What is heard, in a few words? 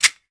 countdown